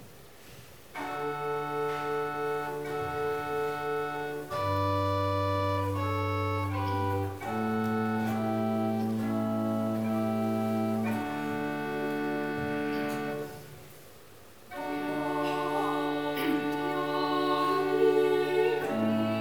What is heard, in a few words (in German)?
Weihnachtsjodler